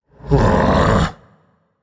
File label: "ugh"; smite; voicepack; set